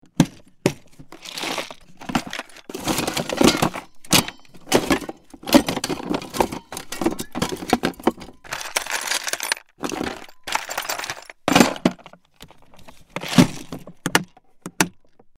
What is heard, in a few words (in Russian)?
yashik s instrumentami